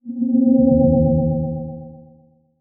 Transition Tremolo; Production Element; Title Transition; AdobeCC

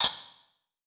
Click Mechanical

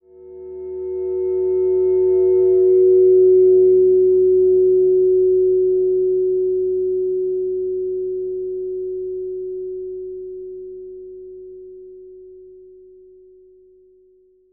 Transition Stab Dark Musical Tonal; Production Element; Title Transition; AdobeCC

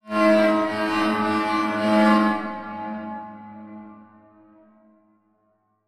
Transition Feedback Warble; Adobe; Production Element

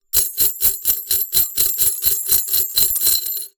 Foley Metal Rings Glass Bowl Rattles

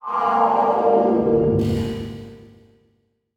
Title Transition Stab Dark Short; Production Element; AdobeCC

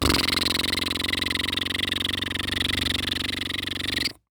Cartoon Human Male Weird Noise Space Ship Noise

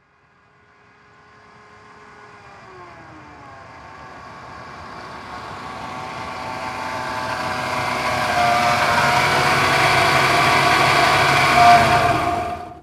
Truck Ford Ranger Drive Up Shut Off Slow; transportation

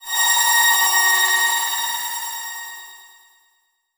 Transition Feedback Ring; Adobe; Production Element

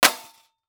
Impact Metal Object Hit By Large Metal Pole; Impacts

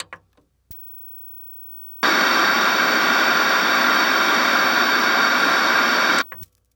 Electronic TV Turn On Static Turn Off; AdobeCC

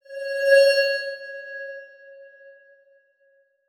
Transition Bell Swell; Adobe; Production Element

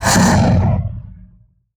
Transition Growls Vocal; Production Element

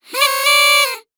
Cartoon Party Noise Blowers Air Blowing

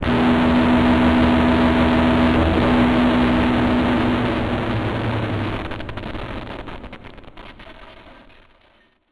Transition Distortion Power Source; Production Element Title Transition

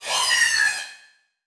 Multimedia Internet CD-Rom Flash Wipe Cartoon

